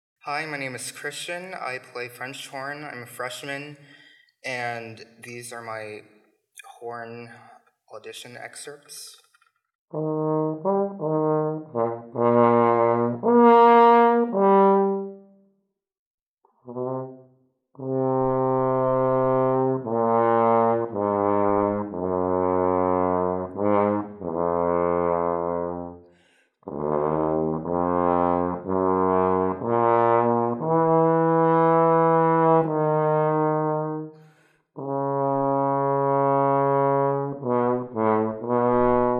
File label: French Horn